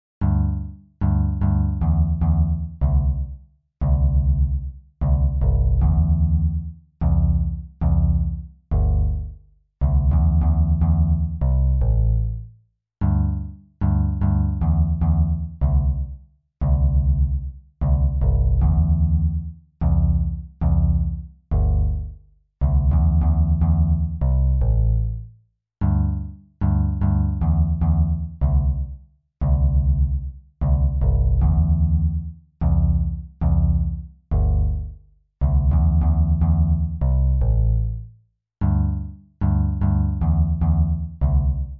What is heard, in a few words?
guitar